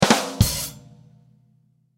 Drum Hit Rim Shot, Drum Hit Rim Shot Joke High Hats; Drum Kit; Blastwave